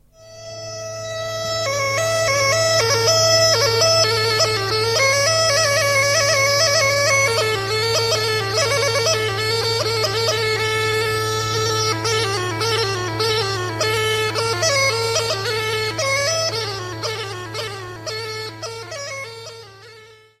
Gajda, Bulgarie

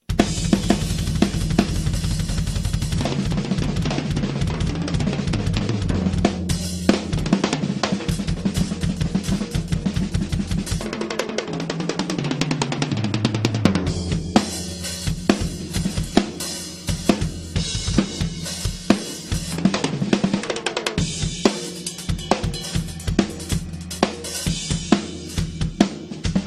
batterie; instruments

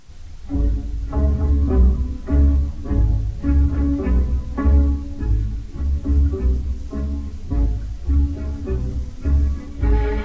Gaida - Roumanie

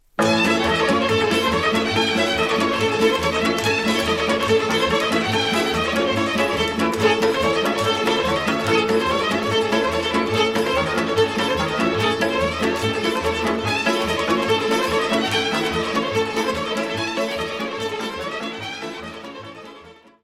Roumanie; Trei Pazeste

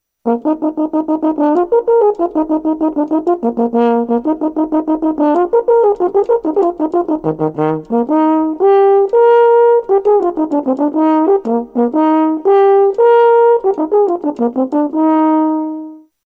instruments cor d harmonie

